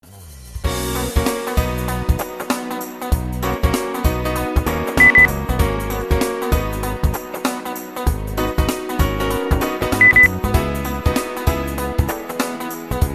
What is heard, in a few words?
pop